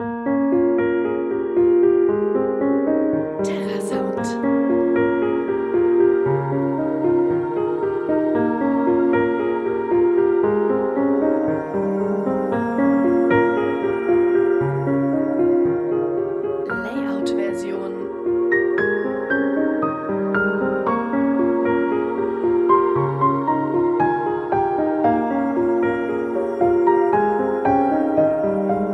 piano rain; terrasound

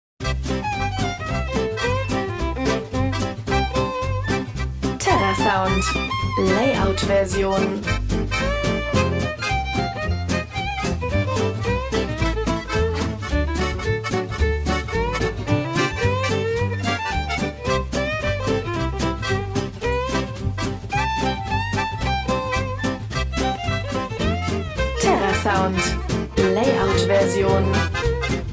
gypsy jazz; terrasound

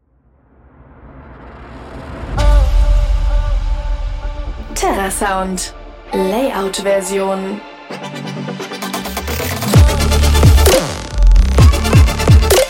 tech success; terrasound

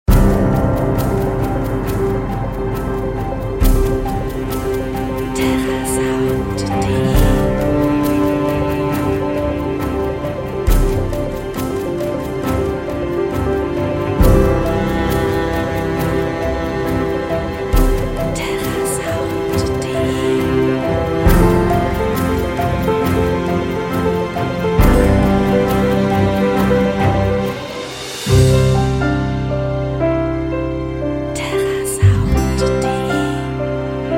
cinematic intro; terrasound

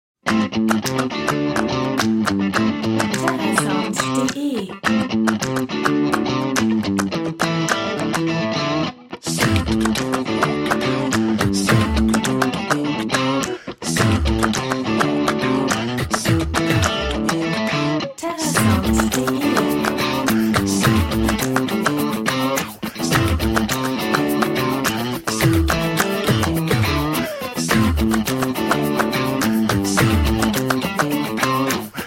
hot touch jingle; terrasound

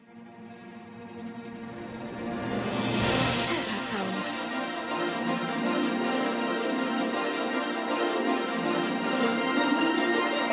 movie trailer; terrasound

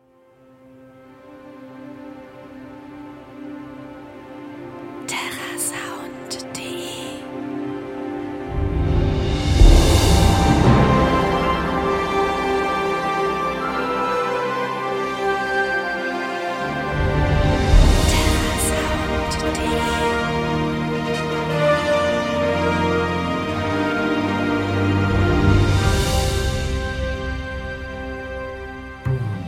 cinematic outro; terrasound

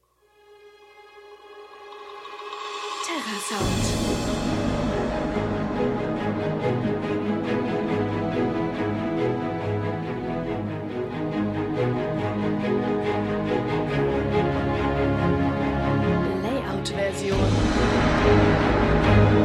trailer instr; evolution; terrasound

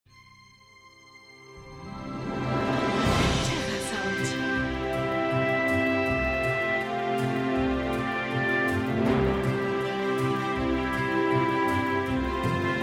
movie trailer; terrasound

